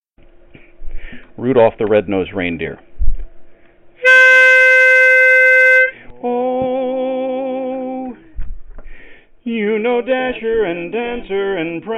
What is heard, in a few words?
Lead; Christmas